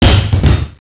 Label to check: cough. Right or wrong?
Wrong